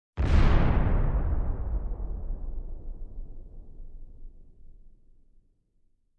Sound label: Bomb Blast far off